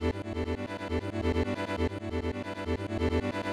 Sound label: techno synth sequence 136; synth loops